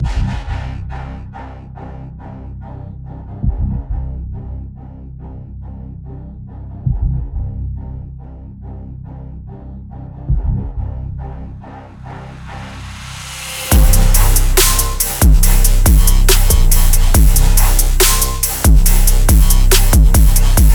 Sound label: Dubstep